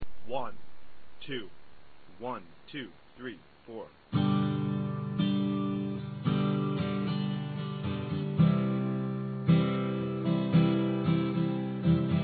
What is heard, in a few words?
strum, guitar acoustic